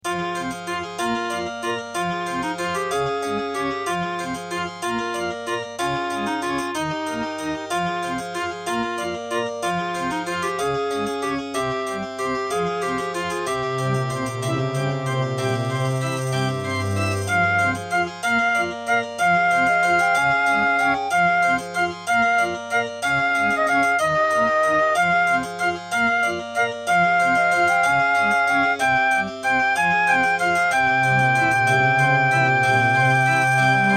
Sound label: Track 6, Diamond Monster Sound 2MB; Death Gate